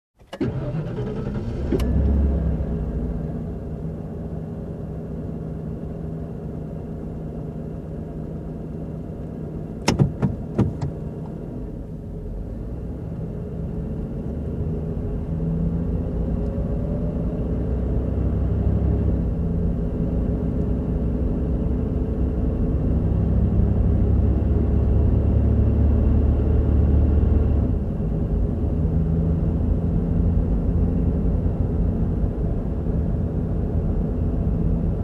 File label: Calm Ride in SUV